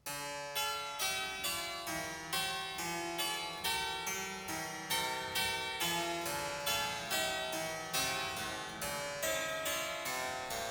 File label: Keyboard Sonata in D Minor, Var.